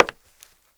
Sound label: HARDWOOD, FOOTSTEPS; 1000fx